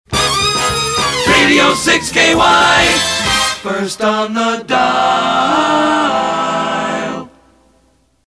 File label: Reverse Sig; 6KY; PAMS